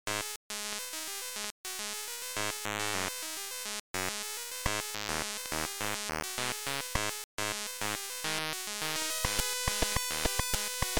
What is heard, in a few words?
Beeps; Over the Era